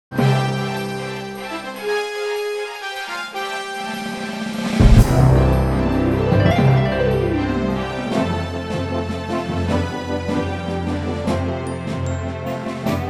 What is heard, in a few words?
reverb